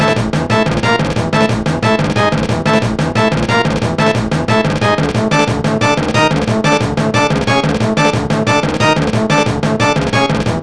boss music; zelda

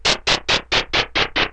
dungeon staircase down; zelda

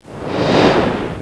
light rush; area49 3

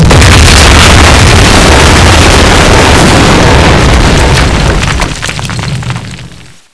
nuke, ambience

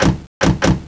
car door; planet3c

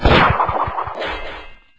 plane grappling hook; overlord

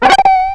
item magic; zelda

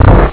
dungeon door close; zelda

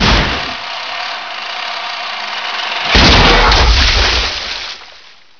lander door; overlord

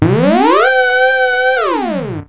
dungeon teleporter; zelda

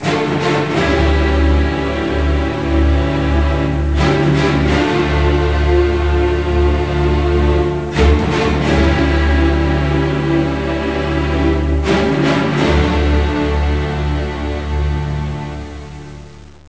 cap music; overlord

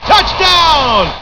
touchdown

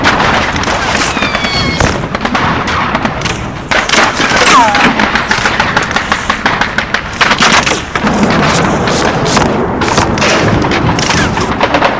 beach allies; overlord

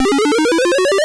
vine; marioball

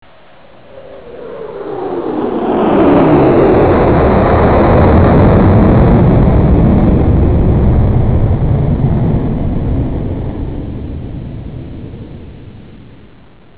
jet crash

